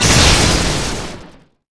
Light Bolt; Seer; GamigoNA

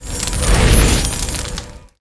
Fire Ball

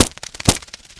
Run

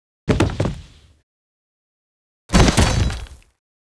Shadow die

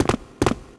Tomb Raider Run

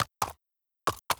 Crane Action; Seer; GamigoNA

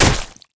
Dmg Staff Skin; Seer; GamigoNA

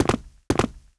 Tomb Raider Walk

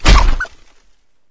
Mimic Die

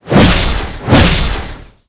attack

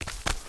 Cat Black run

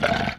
Dmg

